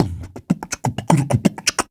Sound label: Percussion Loops, Brazilian; Houseworx